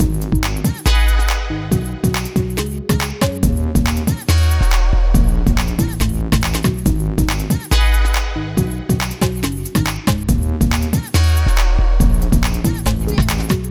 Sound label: Boss Miss